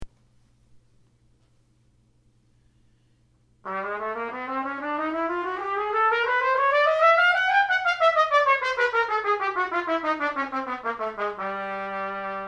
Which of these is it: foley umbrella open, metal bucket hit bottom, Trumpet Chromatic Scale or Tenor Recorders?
Trumpet Chromatic Scale